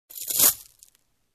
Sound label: Glass splinters